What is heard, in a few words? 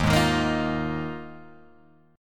Eb Suspended 2nd Suspended 4th, Guitar, Open G